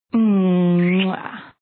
Kiss Sms

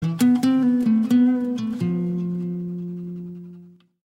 riffff sms

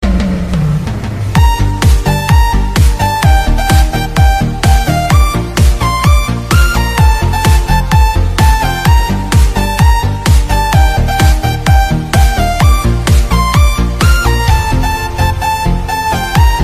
edm rmx

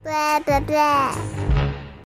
bleh sms

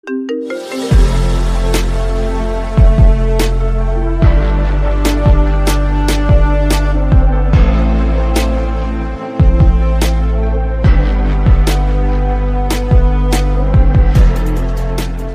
Marimba Remix; Pubg

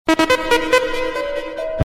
electric sms tone

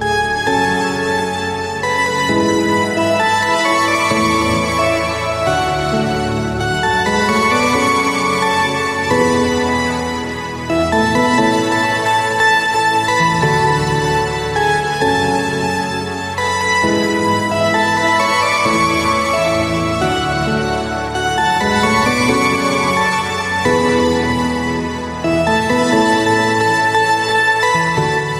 euphoria piano

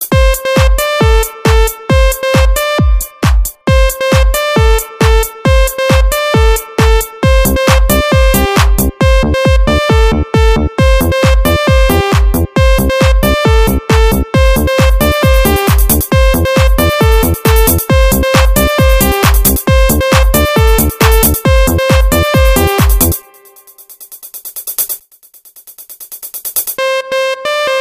Electronic Disc

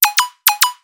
message ticks

